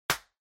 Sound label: Party Popper Dry